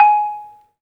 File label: MARM, 3D MARIMBA; 3D Sound; 3D Keys VOL-1